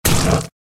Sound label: Tiger Wound